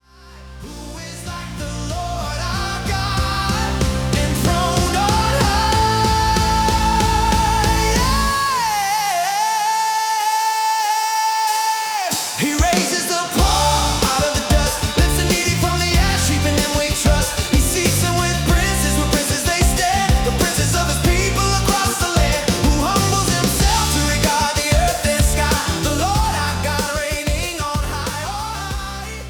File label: Pop Style; latin pop style